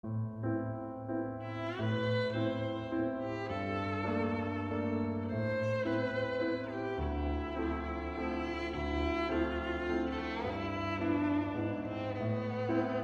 violino

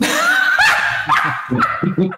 risada enfadonha

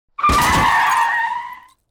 choque auto

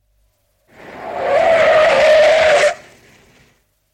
carro derrapando

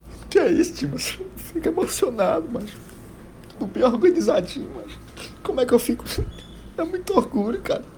chorao

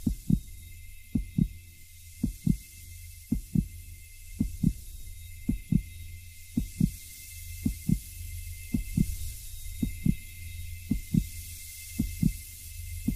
coracao batendo

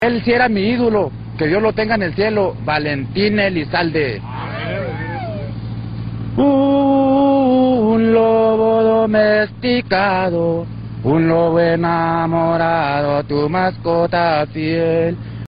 lobo domesticado

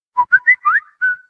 assoviu do samsung piripipiu piu

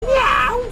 mial

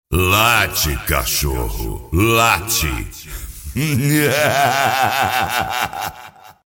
late cachorro

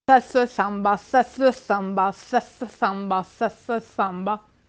samba

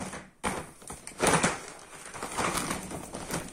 porta pvc

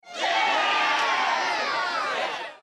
gente yeaa

